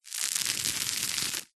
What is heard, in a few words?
office parcel tape strip stuck to carpet finger rub along